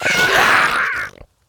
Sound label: Attack; Helpless Hatchling